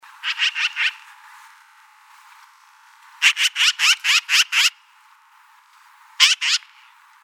Magpie Black-Billed

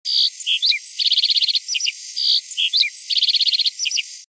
Sparrow Lark

